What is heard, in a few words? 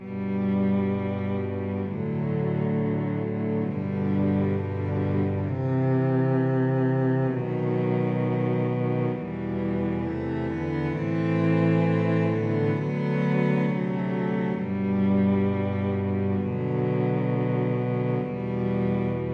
cello quartet